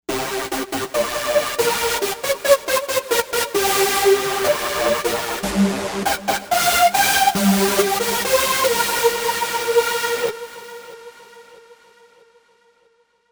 Euro Cheese Fill; Novation UltraNova; Factory Patches

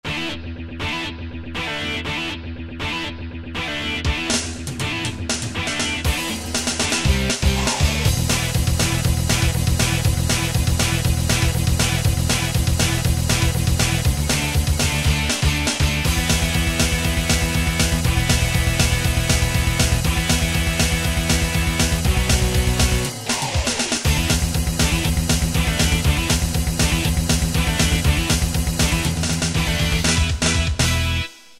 Speed Metal, Yamaha QY70; Preset Styles

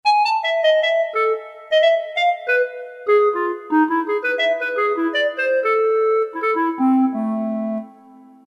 Clarinet 2; Roland MT-32

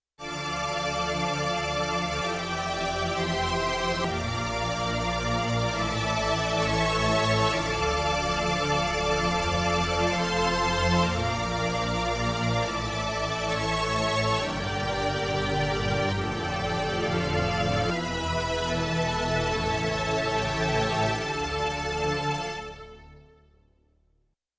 Strings; General Performance

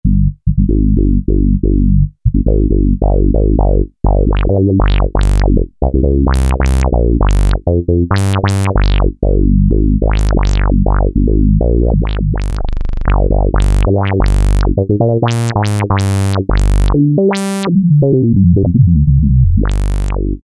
4 Pole Wow Bass, Roland JD-990; Vintage Board

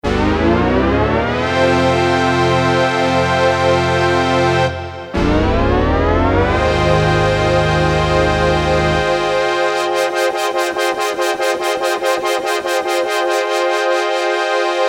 BRASS, Korg DSS-1; KSDU-002 Brass

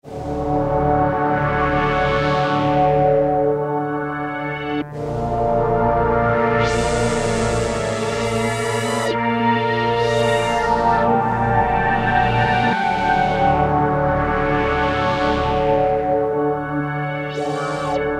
Ambient Sweep; Roland M-DC1

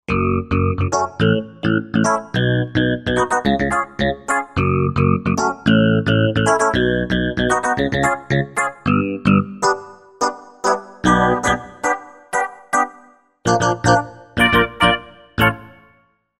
Resonant Waves, Korg Wavestation

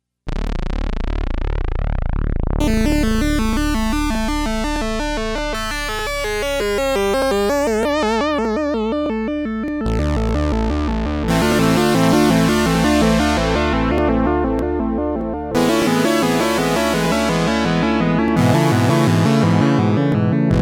Square Mod

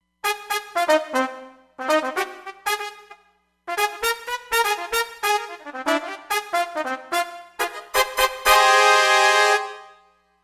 Stab Brs

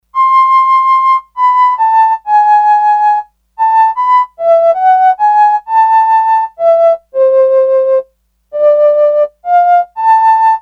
Flute, Sequential Circuits Six-Trak; Factory Programs

